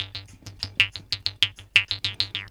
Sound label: KHOMUS